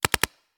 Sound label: Paintball Gun; Sound Ideas; Guns